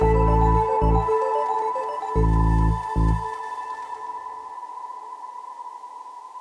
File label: Piano Reverb